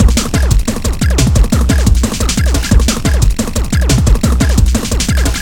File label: HardCore Beats